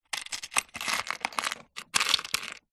industrial tool box plastic compartment screws nails etc rummage